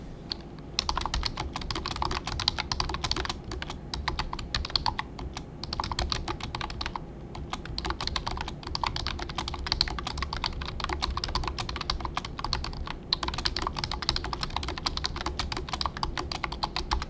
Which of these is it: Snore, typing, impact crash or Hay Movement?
typing